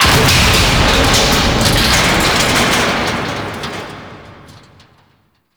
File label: Gas City End Destruction Final Debris; Warframe; Corpus; Ropalolyst